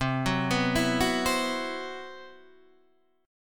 C Major 7th Flat 5th, Guitar DADGAD, chord

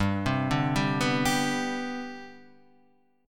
C Major 7th Suspended 2nd, G, chord

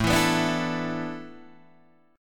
A Suspended 2nd Suspended 4th, Guitar, Open D

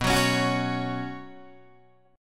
B Suspended 2nd, Guitar, Open D

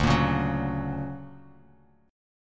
D Augmented Major 7th D down Bass Standard; D+M7 strummed; JGuitar

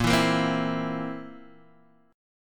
Bb Suspended 2nd Flat 5th, Guitar, Drop D

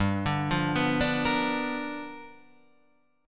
G 7th Sharp 9th G, Guitar Standard; G7#9 arpeggiated

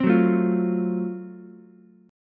F sharp Minor Major 7th Flat 5th, Ukulele; strummed

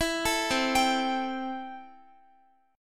C 6th C down Guitar; arpeggiated

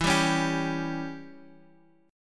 E Minor 6th E down; strummed; Em6

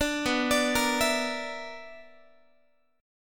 B-Minor Major 7th Double Flat 5th, Guitar; BmM7bb5 arpeggiated; JGuitar

